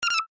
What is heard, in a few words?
UI Confirm, User interface feedback sounds